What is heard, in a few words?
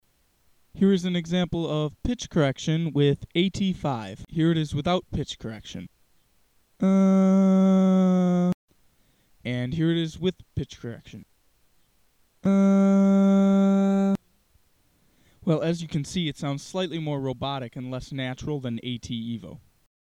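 Pitch Correction Auto-Tune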